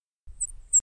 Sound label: high pitch